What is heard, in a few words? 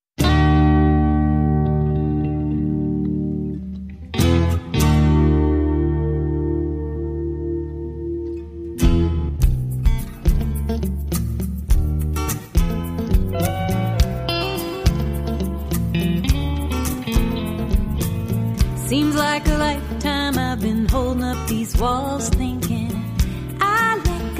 click